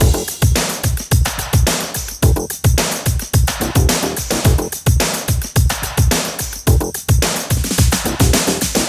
108 bpm drum loop sample f key

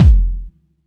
roomy kick drum single hit f key; kick drum samples

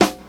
modern good snare single hit b key; snare drum samples

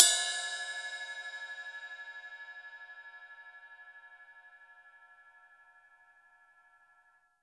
roomy ride cymbal g sharp key; tuned cymbal

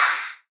trap clap A key, Loudest frequency: 2617Hz; single hits one shots

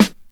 2000s hip hop acoustic snare g sharp key; hip hop snare drums

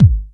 tight lows kickdrum sound g sharp key

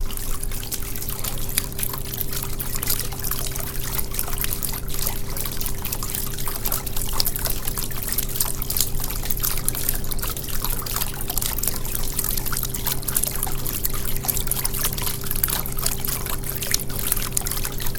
indoor water fountain; soundscapes

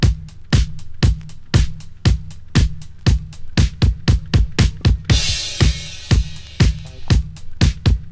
118 bpm high quality drum beat e key

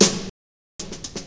00s big snare one shot g key; snare drum samples

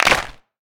reverb clap single hit c sharp key; single hits one shots

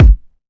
kick single shot g sharp key

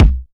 thick steel kick drum sample e key; kick drum samples

